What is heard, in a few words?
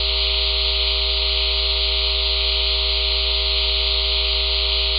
room